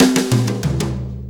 Brushes Fill

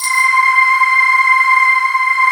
tambo vox C5; BRONXOVOX; Synth

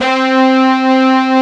BRASS, Brass Bones, Brs Layer

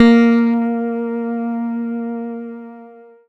PLUCKED, Synclav FM, A#; Big Fish Audio

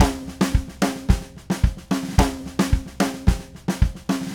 Red Maple Groove 110, DW Red Maple Kit

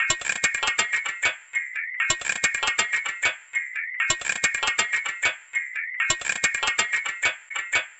Metal Tabla; Sonic Foundry (Sony Creative Software); Myths of Technology; Acoustic Beats

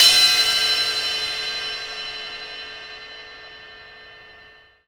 Cymbal Hit, Impact Drum Hits

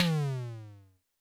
TD-20 Tom B, Roland TD-20 Toms

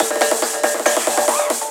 Fills, Loops 140 BPM

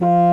C BASSOON F3, REEDS; Greytsounds VOL-1

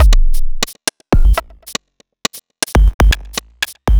Robot Thunder, Modular Electronica; Sonic Foundry (Sony Creative Software)

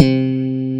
slapor C3

